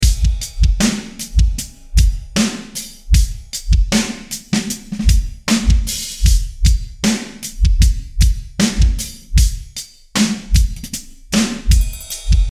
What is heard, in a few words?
4bar 77